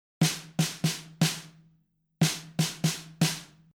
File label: snare